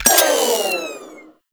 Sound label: Rifle Low Clip Mech; Warframe; Weapons; Wisp Rifle